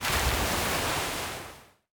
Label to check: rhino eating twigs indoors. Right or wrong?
Wrong